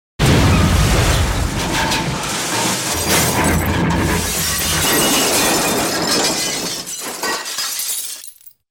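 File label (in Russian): разбиваются стёкла вагона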